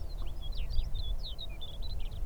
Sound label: Chestnut collared Longspur